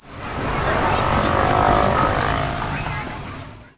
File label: town noise